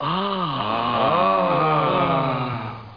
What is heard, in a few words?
WIN